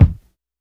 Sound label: kick; Reference Beat BPM-130; Pophits